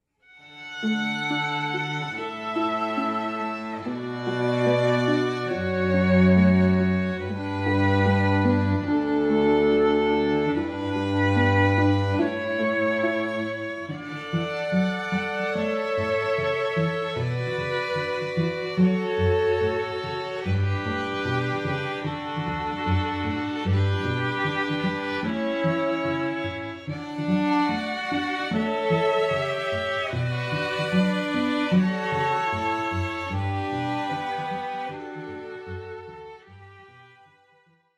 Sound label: Pachelbel, string quartet; Eloquence String Quartet